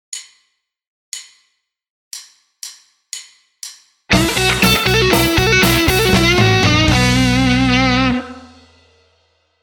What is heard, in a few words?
guitar style